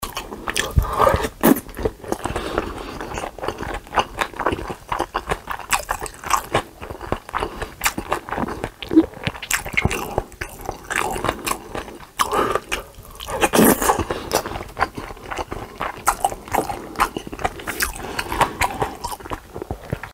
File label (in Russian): jevanie edy s pricmokivaniem; Шум, издаваемый людьми во время кушанья еды